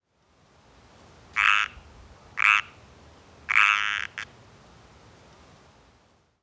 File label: pacific treefrog pseudacris regilla; lyagushek i zhab